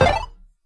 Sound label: long shoot; HeavenStudioPlus; spaceball